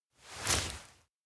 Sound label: toss konpeito mouth; awayuki; anemoi